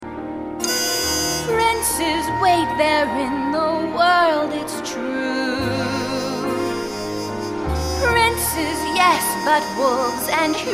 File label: with vocals; audition